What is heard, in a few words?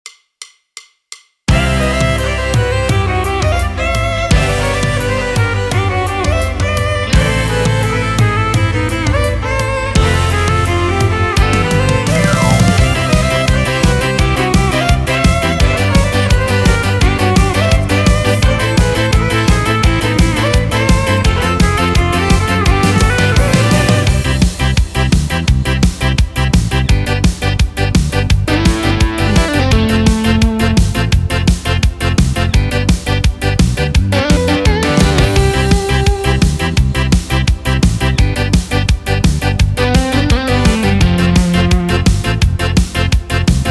Midi Yamaha